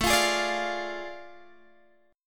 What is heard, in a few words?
A Major 7th Suspended 4th Sharp 5th, Guitar Standard; strummed